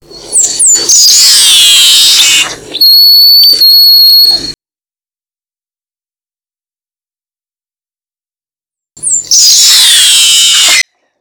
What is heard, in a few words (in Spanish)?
garibaldino